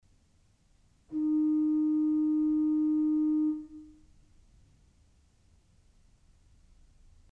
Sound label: Principal 4' dis, ORGEL pipes